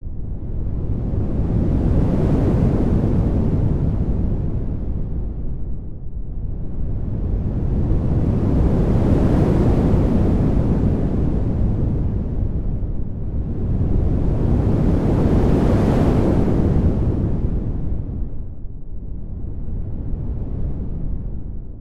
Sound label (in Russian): glubokij veter